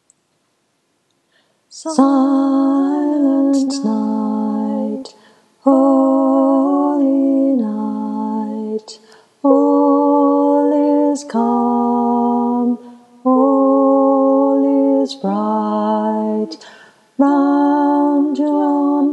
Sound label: Harmony Highlighted; Silent Night